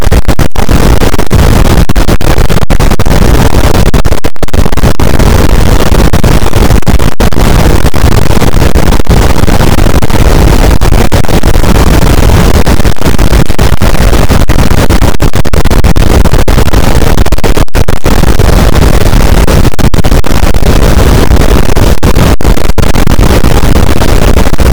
cachalot negatif, boat, Physeter Macrocephalus

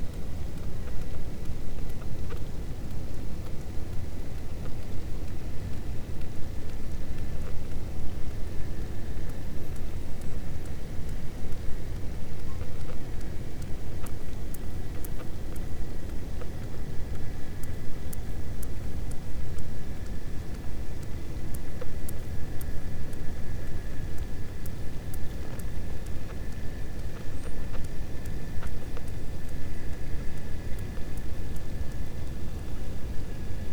cachalot positif, Physeter Macrocephalus

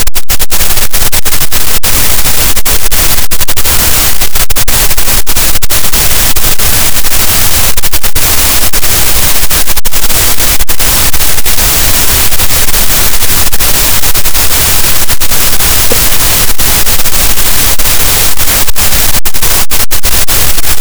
cachalot, gaussian noise; Physeter Macrocephalus

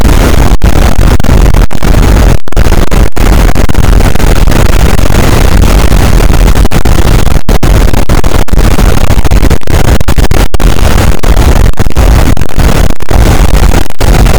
cachalot negatif, boat, Physeter Macrocephalus

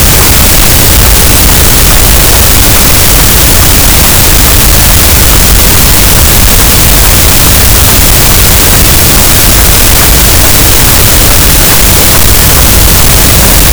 cachalot, Physeter Macrocephalus, gaussian noise

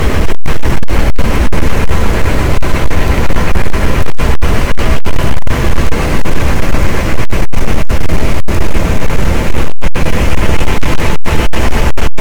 cachalot, boat; Physeter Macrocephalus

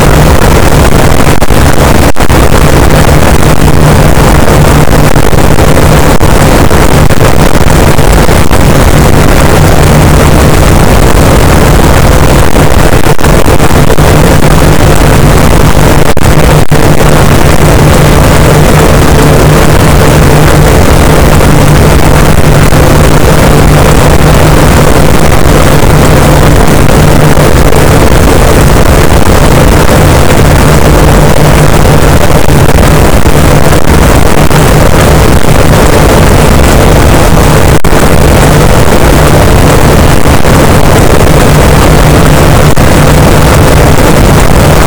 cachalot positif, boat; Physeter Macrocephalus

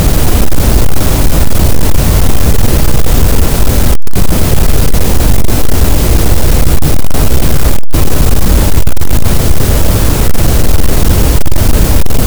cachalot, gaussian noise; Physeter Macrocephalus

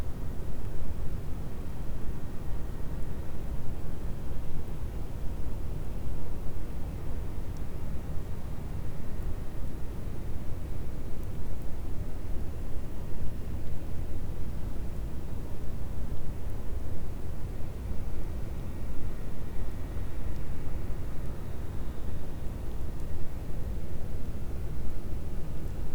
cachalot negatif, Physeter Macrocephalus

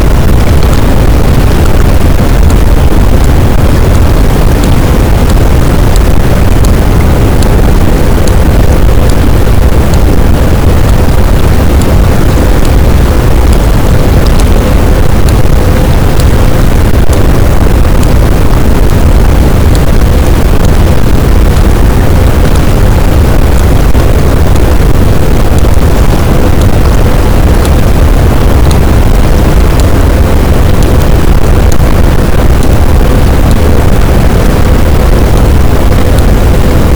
cachalot positif, boat, Physeter Macrocephalus

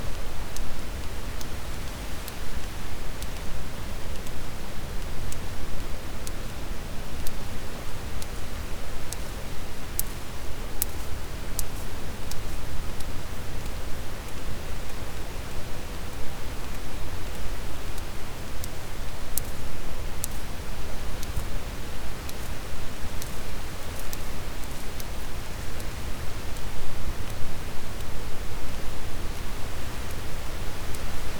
cachalot positif, Physeter Macrocephalus

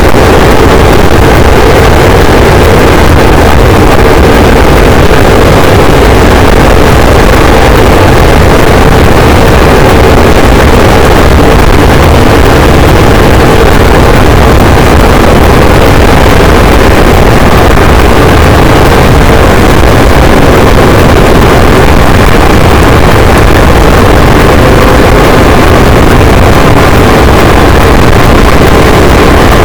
cachalot positif, boat; Physeter Macrocephalus

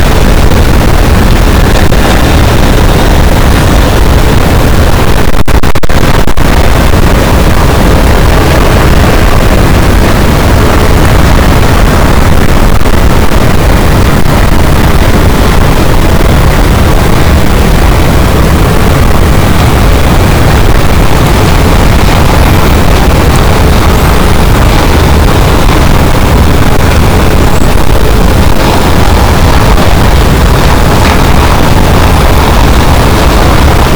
cachalot, boat; Physeter Macrocephalus

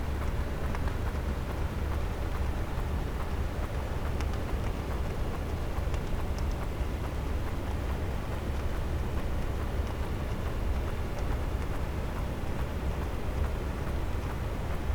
cachalot negatif, Physeter Macrocephalus

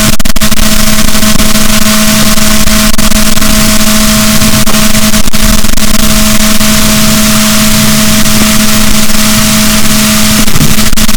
rorquals, boat, Balaenoptera Physalus; SNR 6dB

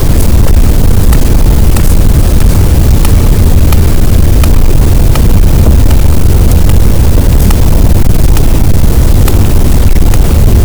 cachalot, Physeter Macrocephalus, gaussian noise

